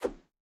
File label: Swing Small